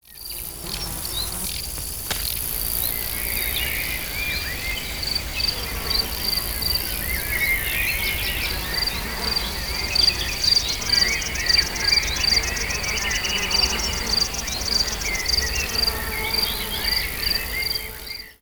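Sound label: sounds of birds and insects in summer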